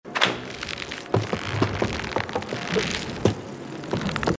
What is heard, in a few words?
lasso; it takes two